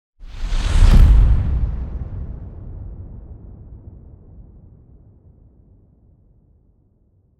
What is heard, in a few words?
Big Impact With Swoosh Dark And Muffled